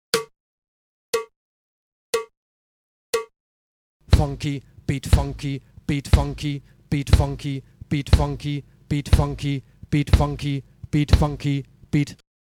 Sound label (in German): Uebung, schlagzeug